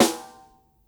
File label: SD, dry kit, Percussion